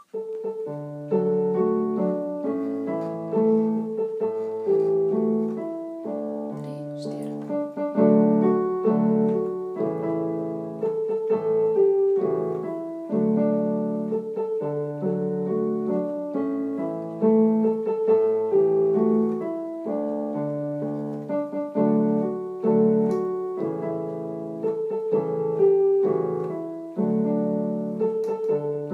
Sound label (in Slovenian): klavir; Vremenska poročila